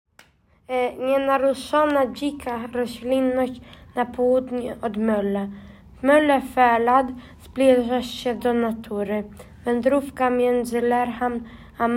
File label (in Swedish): polska; Orört växtrike